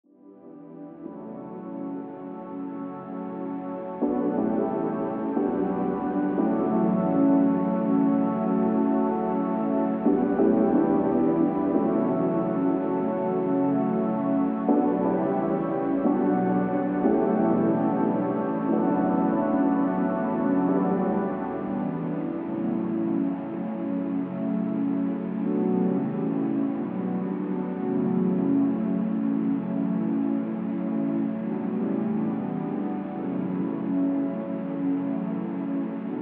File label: Chill With A Vibe Pads